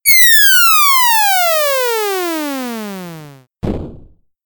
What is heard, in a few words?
falling, sfxr